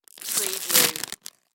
Bag Plastic Wrapper; Foley; sound effects bible

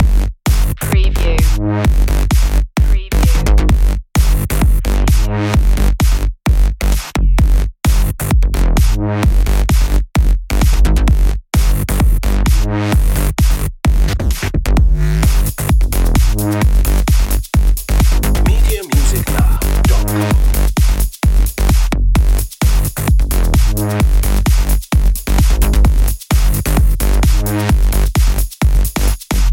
jackhammer loop

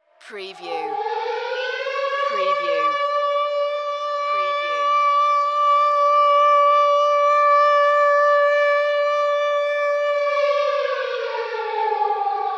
Air Raid Siren Alert

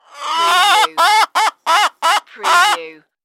Chicken Squawk

